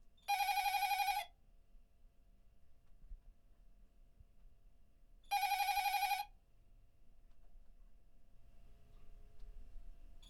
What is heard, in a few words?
Phone ring Oldstyle